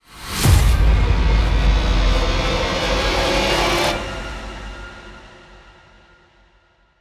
Stinger Modulate ramp

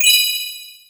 Rankstar

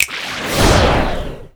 Enemy Majin Summon Hand

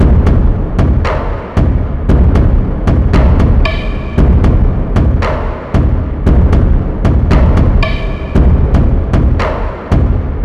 Music Test GROUP ADD DRUMS LOW

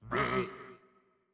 Hurt; Dinkubus

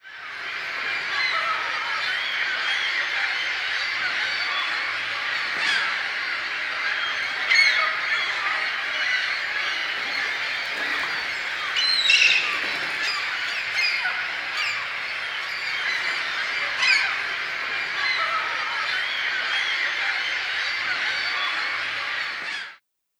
Cities Seagulls

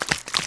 Shake

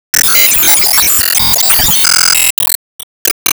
Sys Starbox Open Special

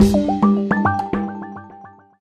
Jingle Record; super rub a dub; ps3

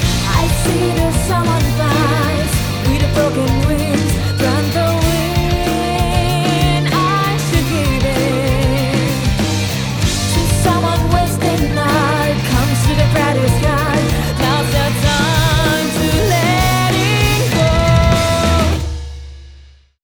Battle; ALTDEUS Beyond Chronos; Android

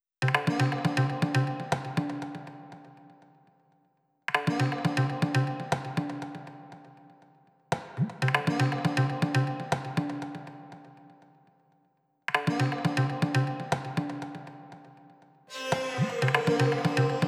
Ethnic 120

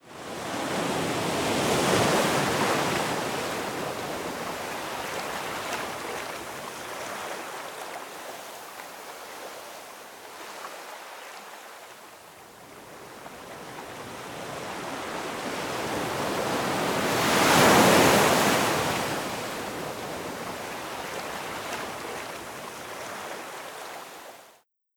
Goldengatebridge Waves; Google Earth VR

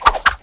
Kill Woosh; Gong

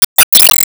Enemy Jet Skill Shield Reflect

